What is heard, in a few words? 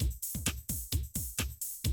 130, Classic DrumLoops